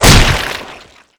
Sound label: kick hit body; weapons; deluge